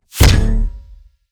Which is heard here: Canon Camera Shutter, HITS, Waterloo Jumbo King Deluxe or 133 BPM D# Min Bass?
HITS